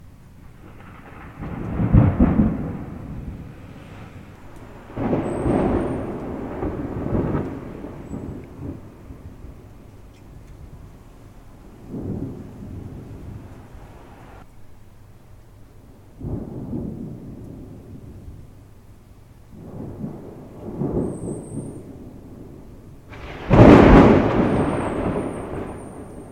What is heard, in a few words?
thunder